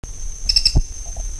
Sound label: Hemitriccus diops